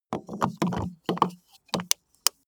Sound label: car bmw e46 exterior gas cap spin close